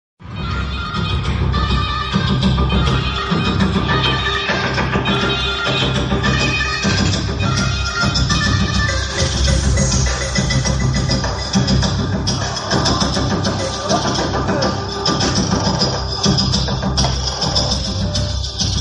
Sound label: bgm tamil; vikram; kamal haasan